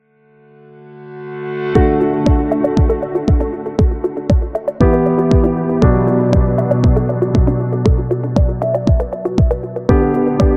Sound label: Soft Corporate